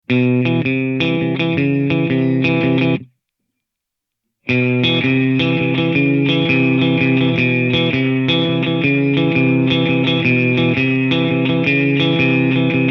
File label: Spring Bright, reverb